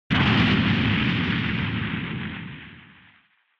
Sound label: Retro Anime Explosion Low